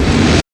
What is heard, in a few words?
NOISE; E-Lab; X Static Goldmine 1